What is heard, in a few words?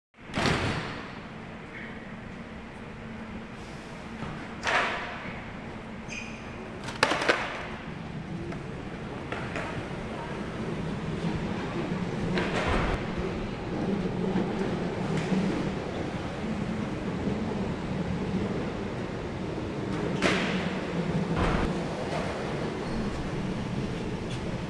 urban public transport